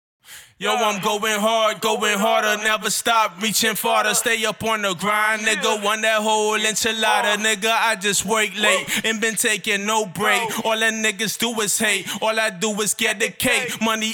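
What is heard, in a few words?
hip hop 150 Am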